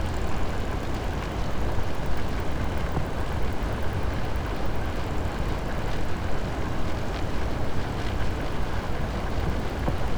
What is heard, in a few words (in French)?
cachalot negatif, Physeter Macrocephalus